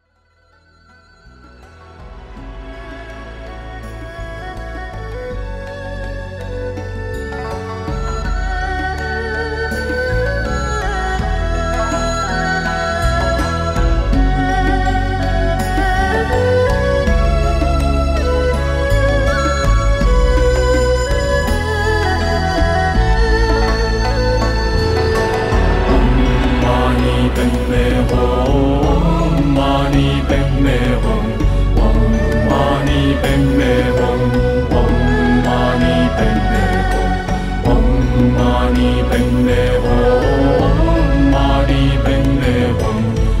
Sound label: om mani padme hum